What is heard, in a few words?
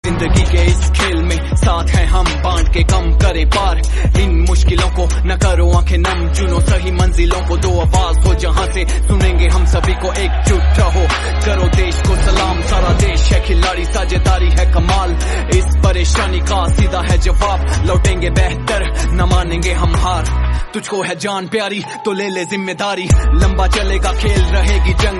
theme song; ipl 2021